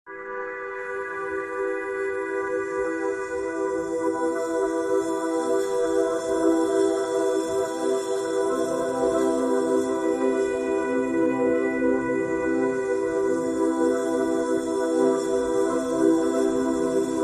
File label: new age electronic